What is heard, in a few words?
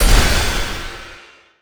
reduce damage